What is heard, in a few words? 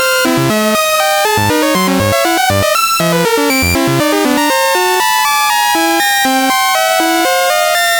RANDOM SAW2